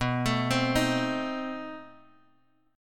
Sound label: B 7th Flat 9th B down, Bass Standard; B7b9 arpeggiated; JGuitar